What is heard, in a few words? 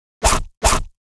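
Mob attack; Monster; Bossmob; 야수맹; 야수귀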